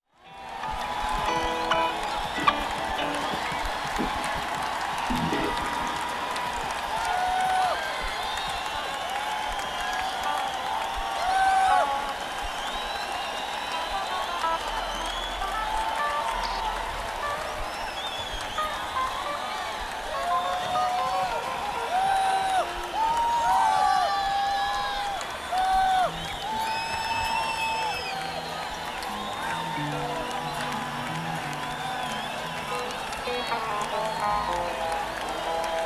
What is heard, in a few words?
Crowd Tuning; beyer m160